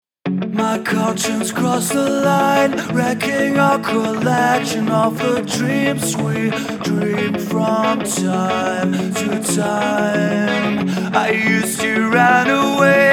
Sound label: pop rock